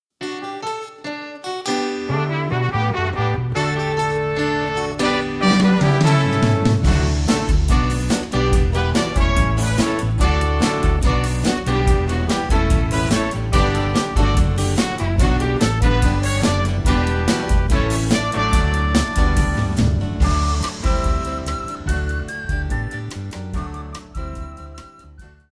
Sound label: Full Length Instrumental